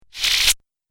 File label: Laser Suck; multimedia